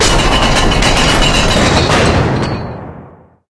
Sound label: medieval door open lower, ambient; Source Engine; tf